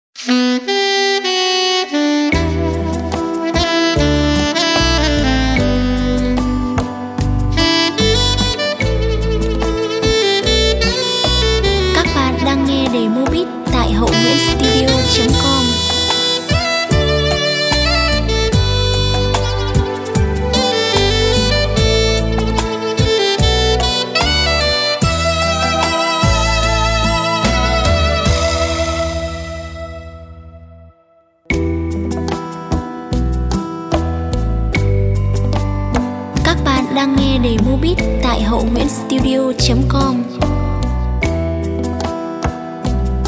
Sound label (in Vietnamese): Chuyen doi toi beat